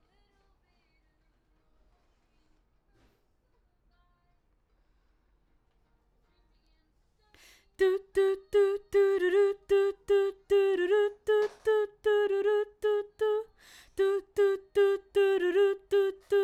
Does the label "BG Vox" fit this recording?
Yes